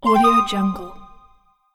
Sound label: soft notification